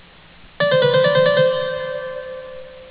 Idem (another trill with initial turn; Palmer: "descending trill")